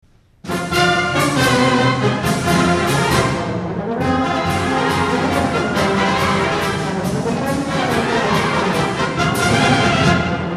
Rolling Thunder March; Winter Festival 2000

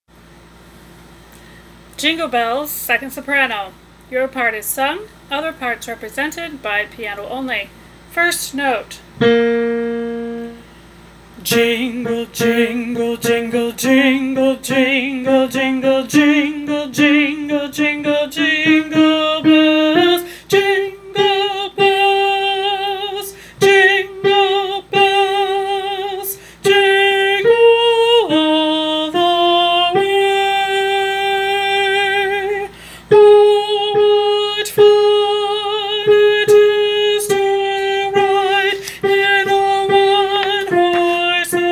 Jingle Bells 2nd Soprano; Chorus Practice Recordings

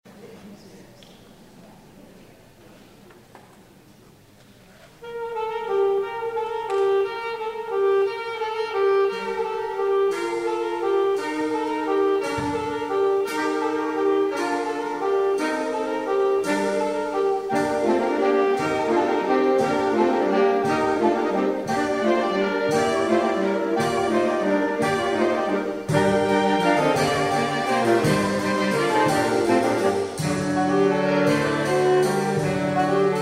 Carol of the Bells Sax Quartet; Christmas 2014

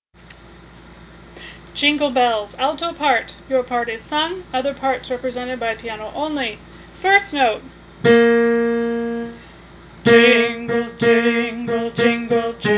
Jingle Bells Alto; Chorus Practice Recordings

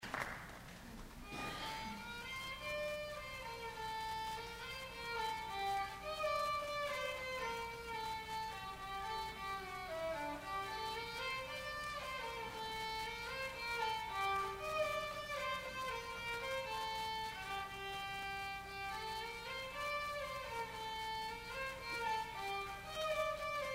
Gavotte in G Minor; 19th Winter Festival 2011

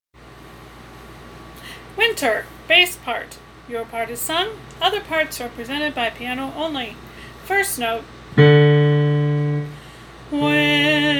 Winter Bass; Chorus Practice Recordings

